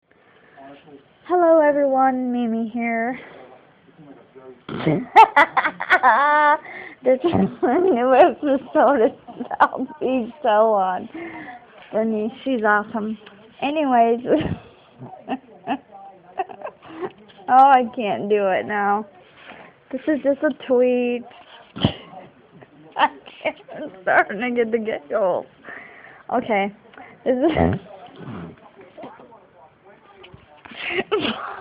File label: giggles